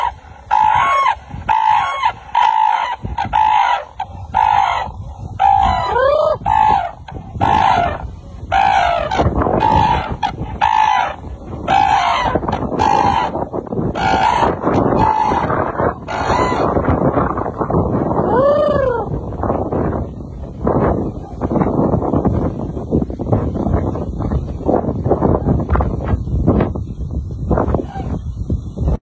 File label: ringtone